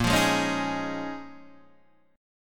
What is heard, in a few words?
A sharp Minor 11th A sharp down Guitar Open D, chord